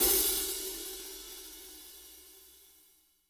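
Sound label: closed hi-hat, natural kit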